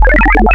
Harmonics Spectral; Ableton Live 12 Lite; Vector; Sprites

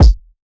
Kick Sampled Basic

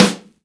Snare 70s MPC

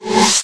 click in; There; ThereClient

